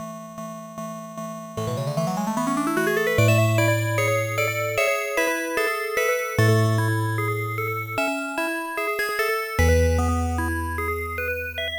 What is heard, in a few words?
Music - BGM